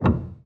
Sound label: piano